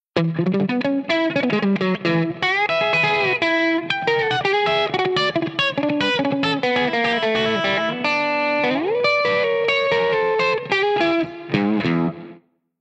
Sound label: Rock A Billy